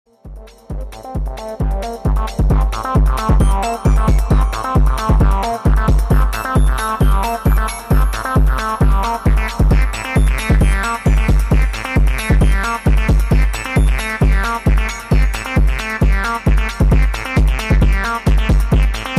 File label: Techno